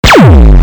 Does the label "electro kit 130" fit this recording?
No